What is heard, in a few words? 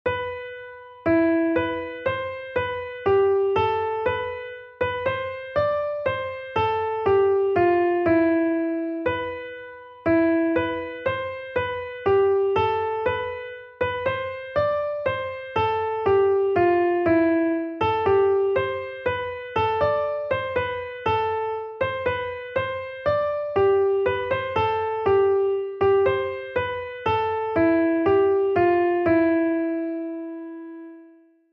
melody